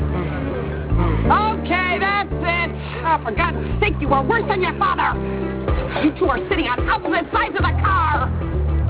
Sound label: kiss